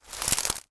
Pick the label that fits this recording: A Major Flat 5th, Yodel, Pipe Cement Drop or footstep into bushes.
footstep into bushes